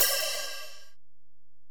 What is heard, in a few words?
HiHat, Alesis DM-PRO